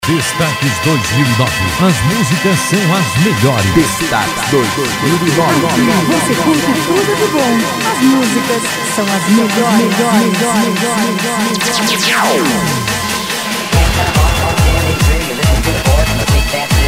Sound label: electro house dance